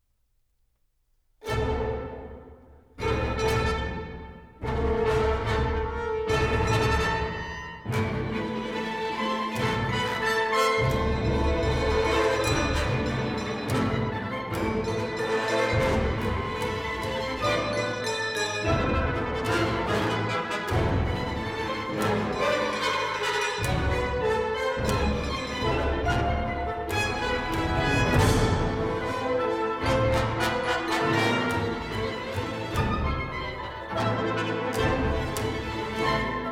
orchestra

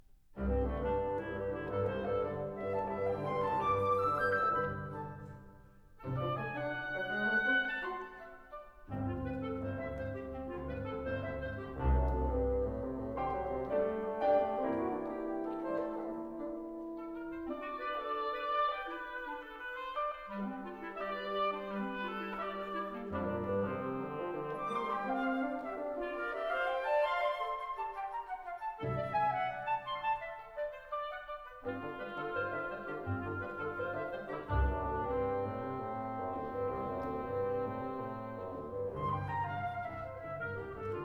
allegro ma non troppo giocoso, orchestra

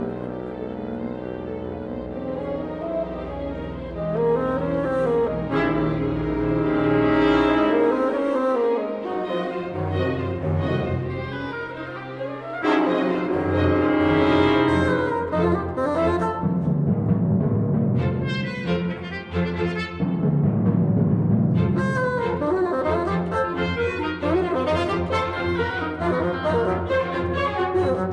concerto for bassoon and orchestra

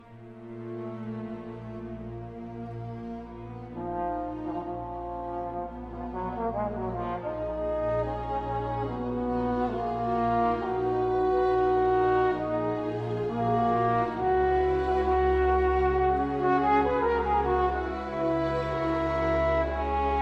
trombone con mvt. ii

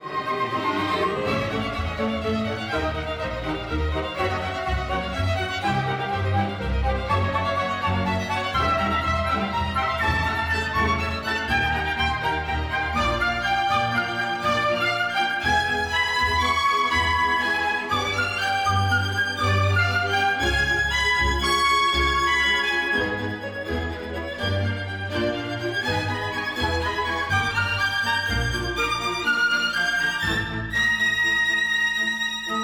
orchestra

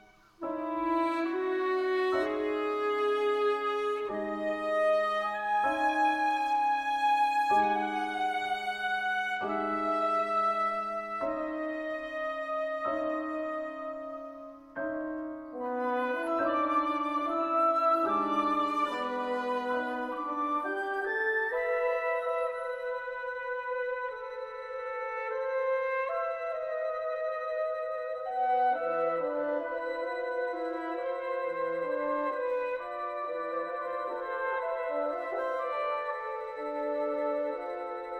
divertimento, seascape, orchestra

